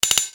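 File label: triple sword hit; breakhack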